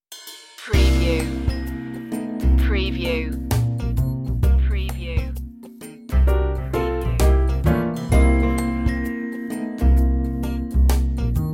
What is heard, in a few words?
life style tv light comedy